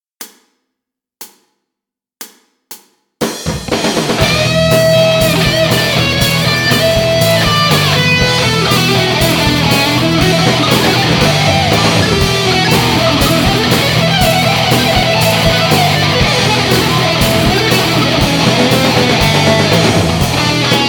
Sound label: daddario exl 115